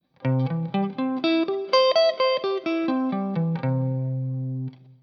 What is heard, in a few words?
C Major Arpeggio Shape, guitar